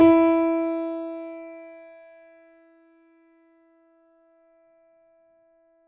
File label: mini grand; bananenkeyboard